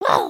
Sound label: headbang; emotes